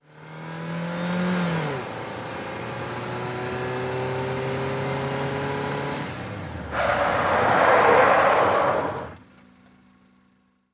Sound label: Sm Car 180 Skid, compact